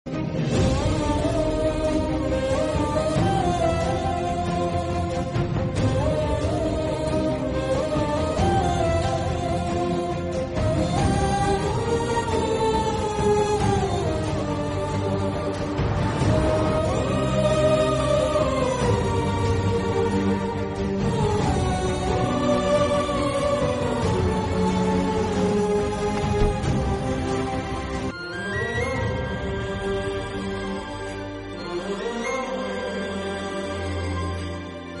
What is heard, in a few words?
background music